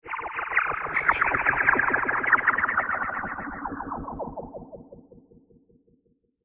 Scifi Bubble, Old School Sci-Fi Analogue Wobbly Bubbly Laser Sound; Tech